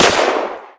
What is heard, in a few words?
p WAAhh